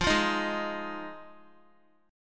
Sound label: F Minor 7th, down Guitar; strummed; tuning 4F,4A,5C,5G,5C,6E